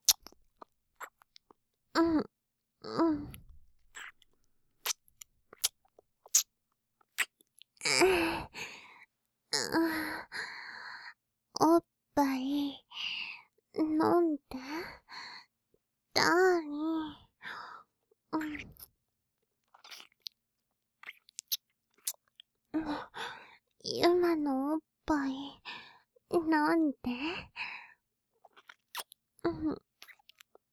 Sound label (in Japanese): キス